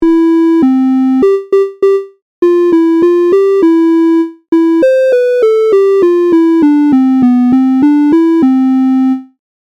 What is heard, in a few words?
Triangle wave